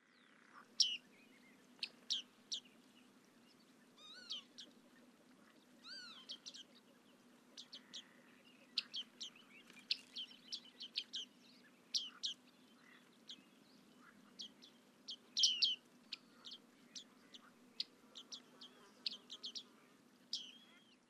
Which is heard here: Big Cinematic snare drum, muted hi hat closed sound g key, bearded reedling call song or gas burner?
bearded reedling call song